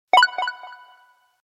htc lightspeed

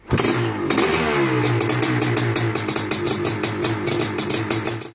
Notification

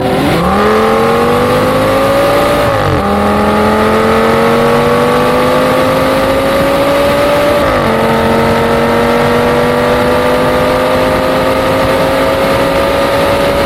Audi s8 v10 sound

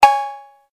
808 Cowbell

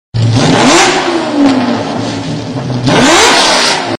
Lamborghini Countach

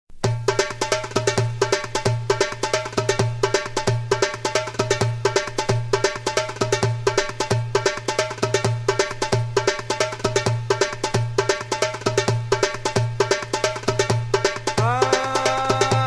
cool drums mix; ringtones

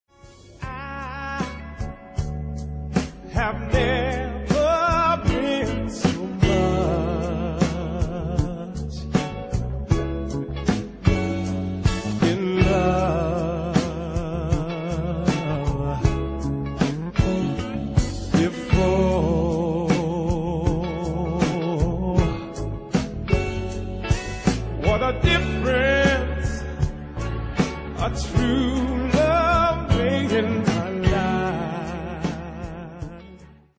love ballad

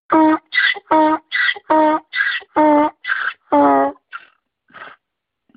donkey real

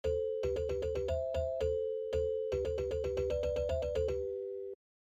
Metal Riff

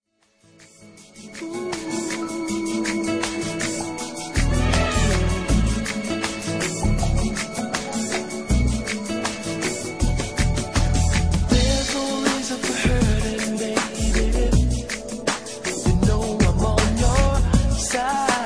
reggae lovers rock

